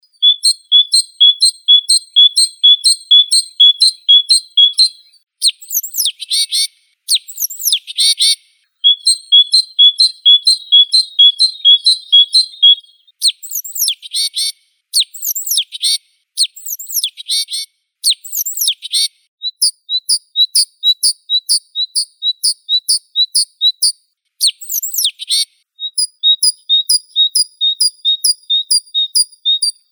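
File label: great tit parus major; sinitsa bolshaya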